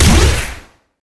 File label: crylink fire, weapons